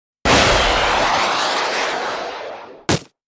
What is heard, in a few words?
Super Jump; SE:Super Jump; Nintendo